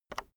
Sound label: keyboard external enter release soft